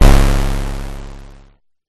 Synth Dirty Distorted Blast Oneshot; sound spark

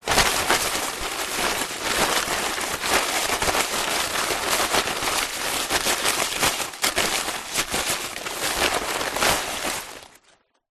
foley paper sheets many crumpled fast movements

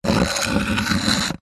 sport cricket bat scrape on hard ground; zapsplat

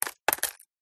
Coins Drop Into Plastic Cup; PMSFX

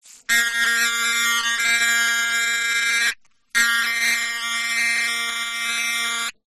cartoon fly buzz; zapsplat

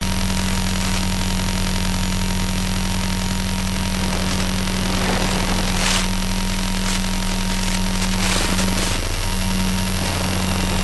Hybrid Electro Magnetic LOOP; PMSFX

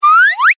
cartoon slide whistle ascend climb very fast

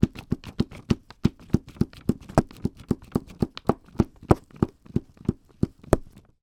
office glue stick dab many times on paper

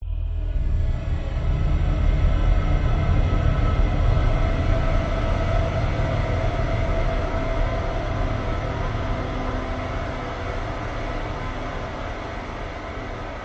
horror swell evil menacing airy; zapsplat

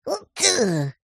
cartoon male sneeze

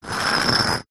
brick scrape on smooth concrete; foley

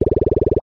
cartoon pops fast multiple pop out

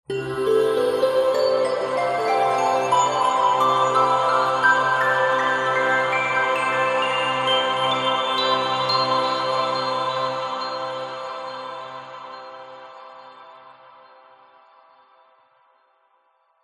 heavenly dreamy harp glissando choir; sound design

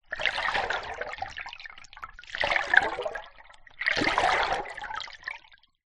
water underwater movement; nature; zapsplat

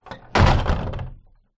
household doors double wooden old close

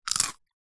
human eat potato chip single bite crunch; zapsplat

